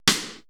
80S DRUM SET; Best Service ProSamples vol.55 - Retro Sampler; AKAI

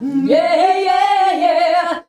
"HNYEAHI", FEMALES; Best Service; Hyper Dance

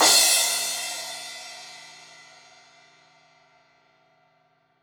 Crash 16in edge, Cymbals; Deeply Sampled Drums

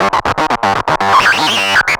Analoop, Industrial; Analog; Analoops; Sonic Foundry (Sony Creative Software)

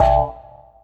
Metals Oneshot; Sonic Foundry (Sony Creative Software); Esoterik Beatz

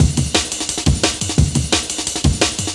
DNB BREAK; m8 breaks

